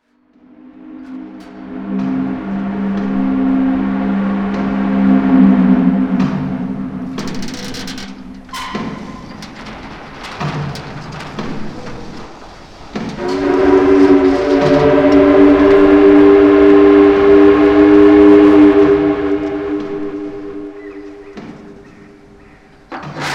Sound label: Metal Ambience